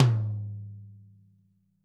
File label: Toms; Bob Clearmountain Drums I